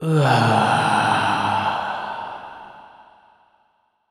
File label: Vox FX; Sonic Foundry (Sony Creative Software); Electrocution